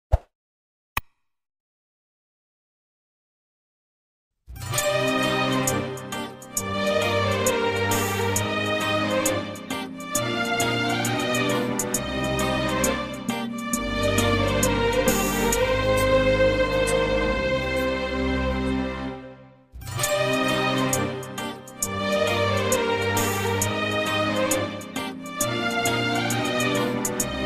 Telugu ringtone; andala rakshasi

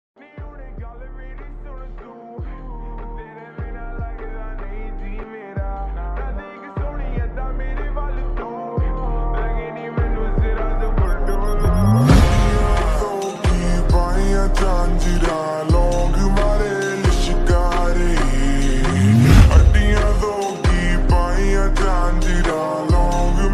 slowed lofi beats ringtone; remixshop

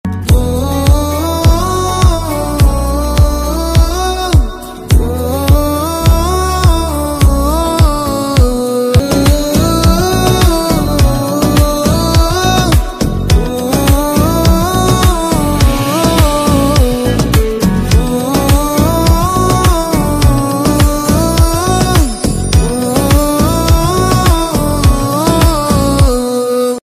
song promo bgm ringtone; sankranthiki vasthunam

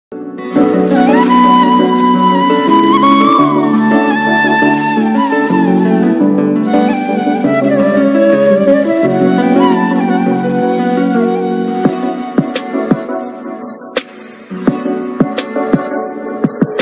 very sad ringtone instrumental music; khuda aur mohabbat season 3

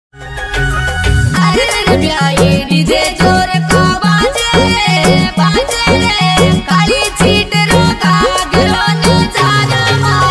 rajasthani marwadi ringtone

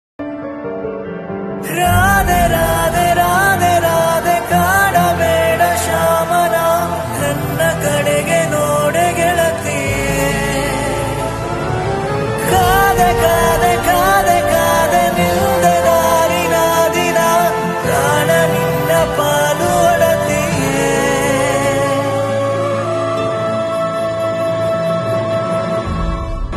love bgm, kannada ringtone; raider movie

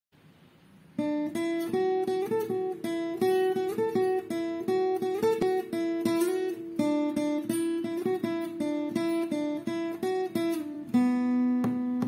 bgm in guitar; nenu local

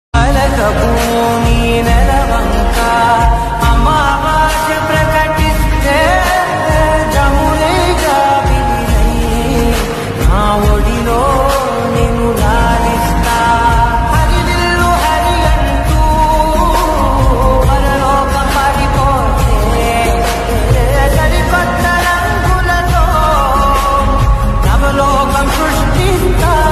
song ringtone bgm

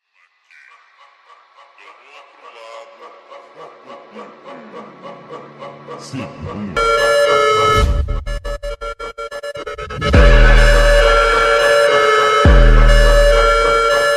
beat mata montagem

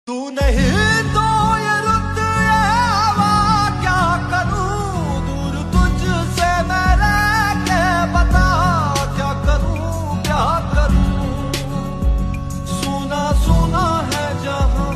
nice song

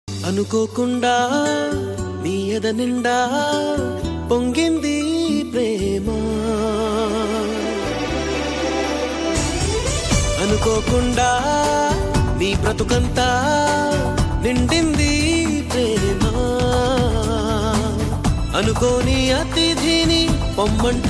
sad song, telugu

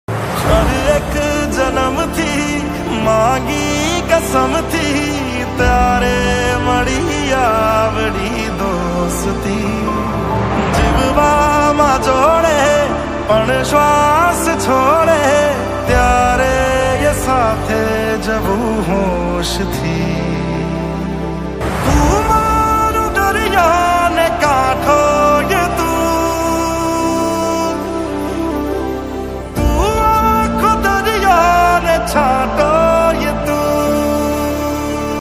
ringtone, gujju ringtone

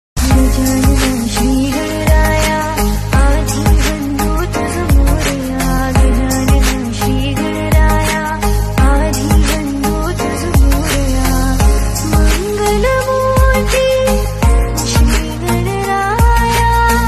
marathi ringtone; ganaraya

